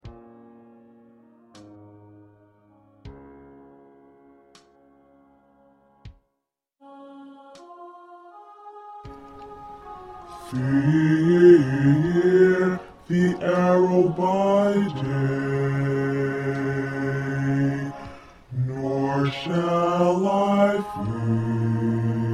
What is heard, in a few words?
Bass